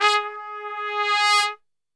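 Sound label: G#2 trumpet, Brass Super Section; Best Service; TRP SWL; 90 s Sample CDs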